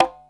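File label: conga open Slap, Yamaha Motif ES drum machine; 300 Drum Machines; Yamaha Motif ES-Series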